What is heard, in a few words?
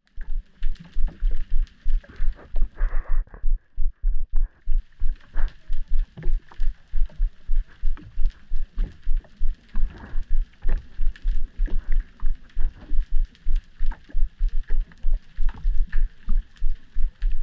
San Juan Bay Hidro Noise